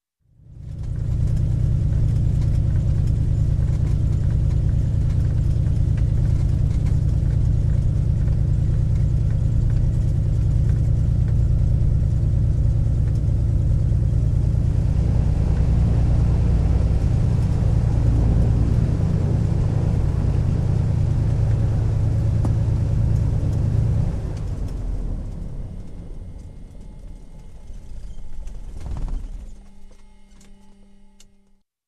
Propeller Aircraft parking and engine shutdown in cockpit